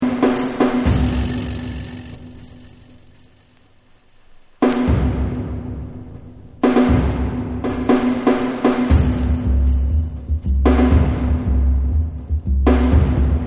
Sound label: Drum Mix